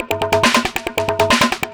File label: drm 138, Techno, drum-loops; Techno ID; franchise-loop